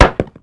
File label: wood; Mafie 1